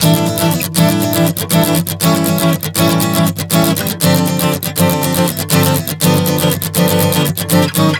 Asus-A7-Gsus-G7 120 bpm, acoustic guitar chord progressions